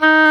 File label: OBOE, Orchestral Winds, Dry